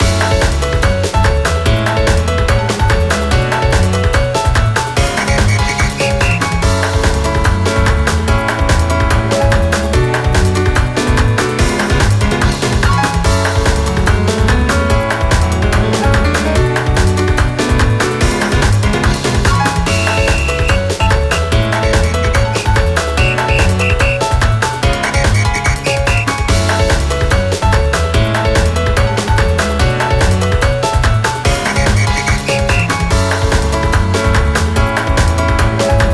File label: Break Time BGM; pachislot nanatuno taizai